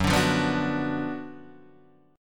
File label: Gb Major 7th Suspended 4th Sharp 5th, down, Guitar, Standard; GbM7sus4#5 strummed; JGuitar